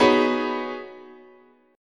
B 6th Add 9th, chord, guitar

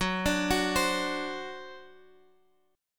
D 7th, F sharp

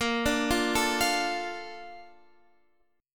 Bb Major 7th, Bb

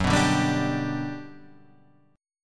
G Major F sharp, chord

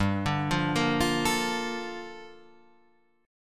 G Minor 9th, Guitar

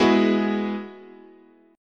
Ab Minor 7th Sharp 5th, guitar chord

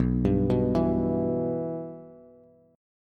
B Suspended 4th Sharp 5th, Bass

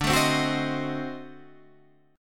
D 7th Flat 9th D, chord

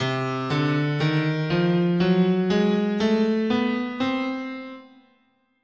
C Diminished Whole Half, scale

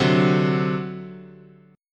C Suspended 2nd Flat 5th, guitar chord

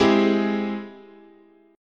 G sharp Augmented Major 7th, chord

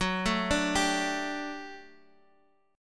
D Suspended 4th F sharp, guitar

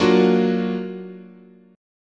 F sharp augmented major 7th, chord

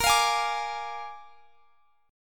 Bb 7th Bb, chord

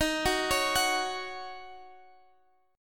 Eb Minor 7th Eb

